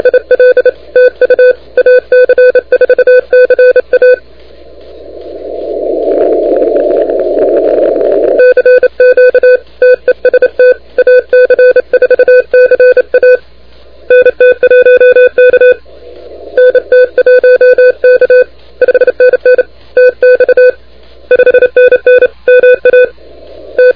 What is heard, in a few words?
CW 28MHz; AC4CA de NT1K; ARRL10M